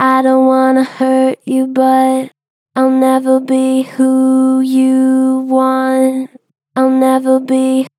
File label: Gen Flat I dont wanna hurt you, Vocals; Harmor Vocals